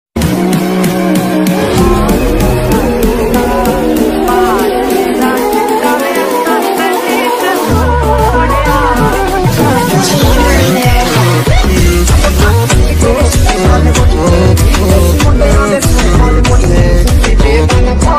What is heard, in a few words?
new bgm ringtone remix ringtone attitude ringtone; ram pothineni; rowdy